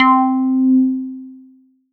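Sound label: PLK C4, Roland SH-09 synth pluck; Leads and Keys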